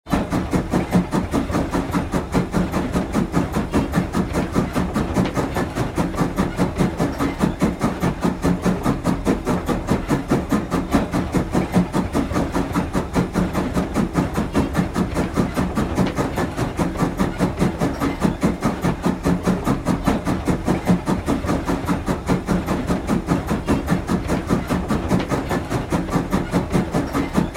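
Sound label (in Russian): звук внутри паровоза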